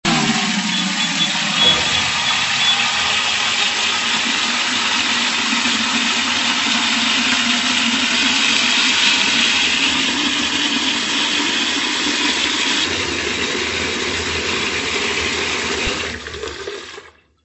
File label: Fill Er Up